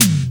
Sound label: eighties clear tom drum single hit d sharp key